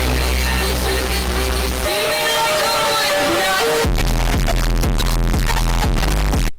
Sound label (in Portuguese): musica de sigma estourado